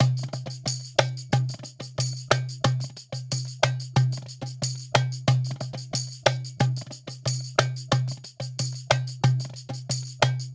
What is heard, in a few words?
Percussion, cinematic funk; Sonic Foundry (Sony Creative Software); Cinematic Funk Urban Tone Poems